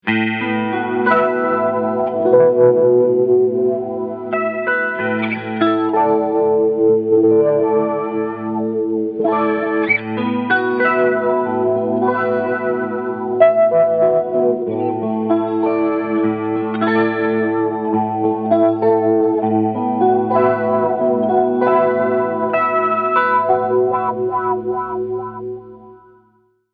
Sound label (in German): Morley AFX-1 Wah Chorus Echo 12 Uhr